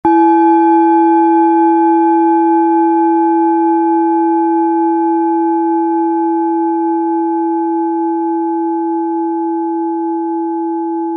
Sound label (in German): klangschale messing